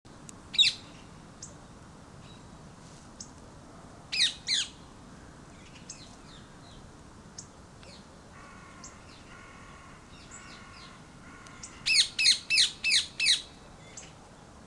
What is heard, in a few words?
etiler psittacula eupatria and others